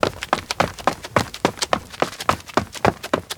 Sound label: steps outdoor road sprint loop; anemoi